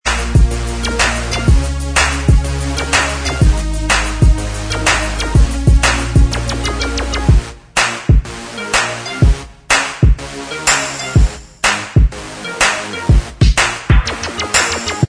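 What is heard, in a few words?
Inst Track, instrumental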